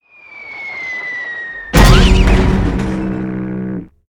drop boat miss

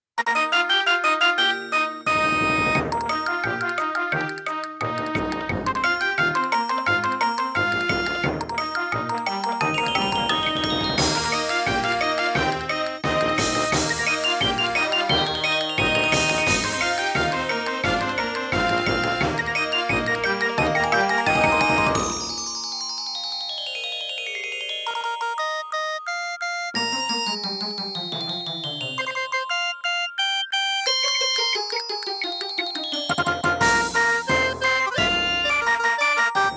Firework music

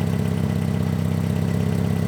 KART loop

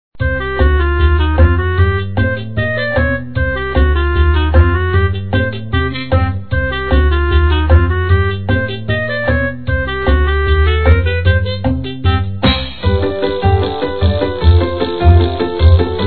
Happy Dance

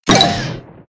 Mole Stomp

